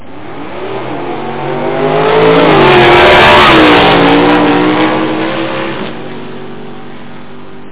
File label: DRAGRACE, VEHICLES